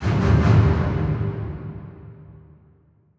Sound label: Stabs Strings Low, Horror Strings